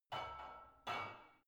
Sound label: barbell only replace reverberant soft; sport freizeit; fitnessstudio